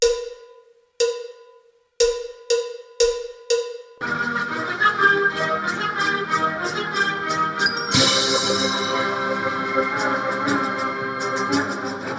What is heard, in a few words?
Gb Eb